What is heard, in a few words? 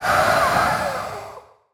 Zombie Throat, Monster Sounds; The Decline Of Warriors; Monster Sounds Volume II